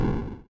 firebolt hit; wizard; Adventures in Lestoria; action RPG game; Sig Productions

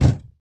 warrior block hit; action RPG game

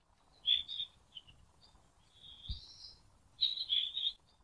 birds; Adventures in Lestoria; action RPG game; Sig Productions

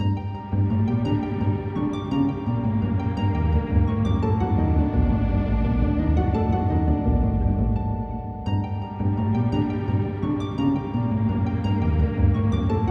bg music

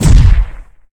ranger charged shot; action RPG game

